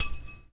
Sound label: GLASS BRUSH, percussive